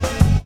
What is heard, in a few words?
HIT, Hip Hop Misc Perc; E-MU Producer Series Vol. 8 – Platinum Phatt